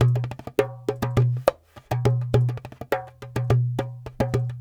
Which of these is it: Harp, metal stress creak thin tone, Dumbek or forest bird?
Dumbek